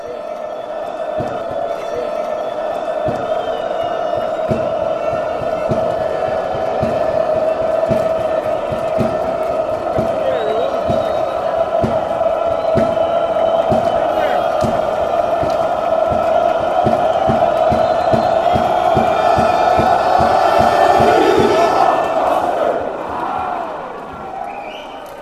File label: goal kick, chants; ni